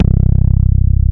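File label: JV house bass, JV-1080; Syntec; Wall of Sounds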